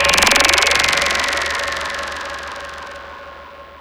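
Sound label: SYN left, Production Effects; Best Service - XXL Production Effects; SYN-FX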